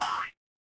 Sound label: cartoon boing